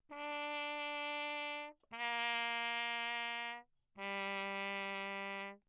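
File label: trumpet mute Bach low; Perinétventiltrompete; Alessi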